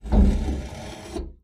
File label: metal gate latch; latches & bolts